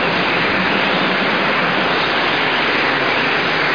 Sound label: bird looping wind; AmbOutside; PC Gamer IT CD 46; utdemo3dfx